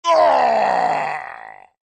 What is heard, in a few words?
death sound; darkheart; the battle bricks